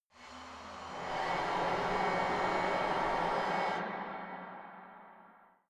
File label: motor function engine, horror